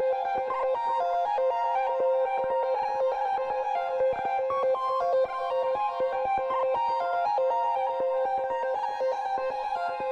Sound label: melodic enchantment